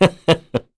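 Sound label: Laugh, Korean; heroes; Roman; kings raid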